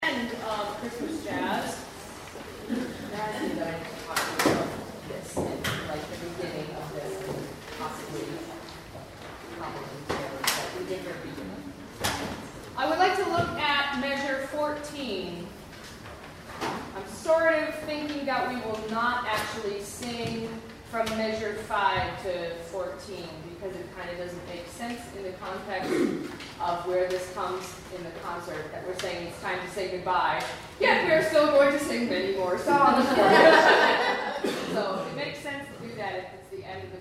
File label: christmas jazz